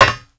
player metal; svencoop

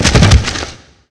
wood crash; svencoop

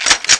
slide, mp5

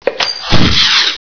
launch rocket; gearbox; demoman

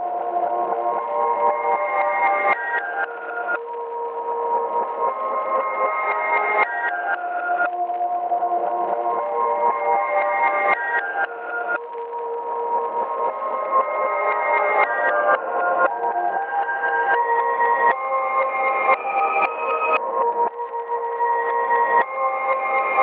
calm loop; svencoop

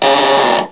wood creak

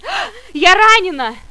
hit, medic; svencoop; paranoia

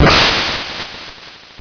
miss; svencoop; sc retrohunt

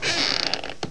creak; svencoop; keenhalloween

